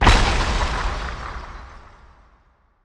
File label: asteroid damage oxide huge; Factorio